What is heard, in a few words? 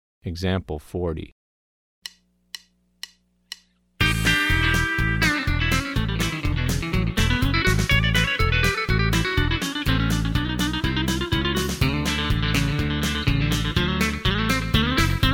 Country Rock